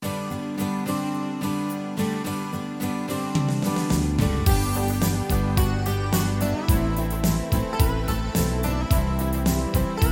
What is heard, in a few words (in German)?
Schlager Oldies Rumba